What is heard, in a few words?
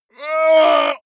male groan strain; menschen; schreie